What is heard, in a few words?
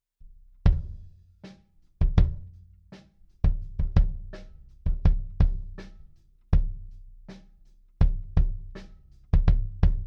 bassdrum